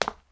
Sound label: FOOTSTEP CONCRETE